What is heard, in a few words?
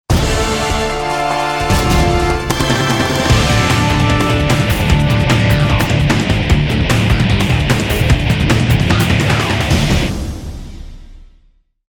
Combat Jingle Enemy; darkstar one; windows xbox 360